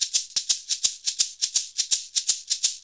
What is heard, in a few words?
SHAKER, Modern Percussion Loops; USB Soundscan vol.56; AKAI